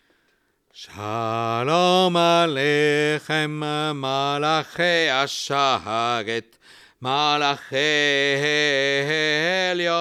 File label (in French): Chalom Alekhem; la liturgie du chabbat; offices de chabbat